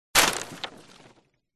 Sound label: crashes wood; Audio Mangler